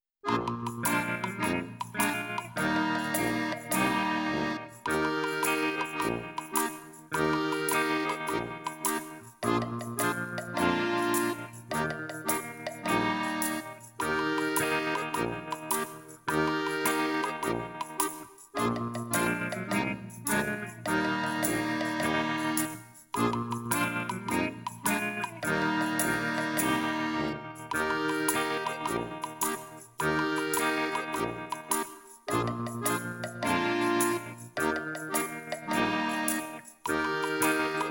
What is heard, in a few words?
backing track